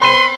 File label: alarming